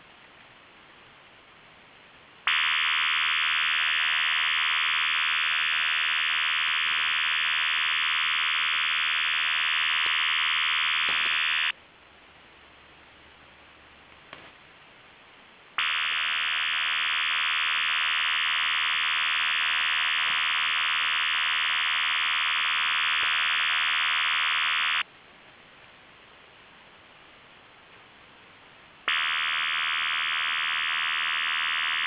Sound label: codan chirp calm